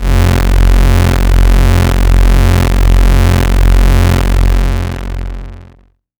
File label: minimoog bass fat